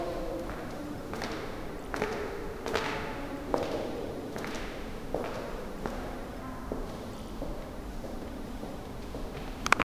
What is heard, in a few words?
footsteps echoing in a; arabic